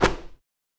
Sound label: Snow